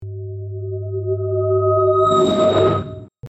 Sci-fi alien warp granular tone

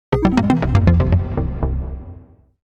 Game over lose; gfxsounds

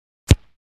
American football kickoff

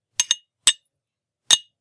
Knife clash metal on metal impact

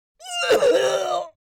Witch fantasy vocalization pain hurt

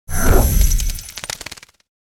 Vampire transformation bats morph

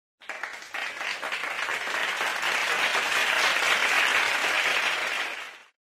Crowd applause

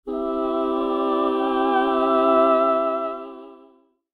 Heavenly choir swell

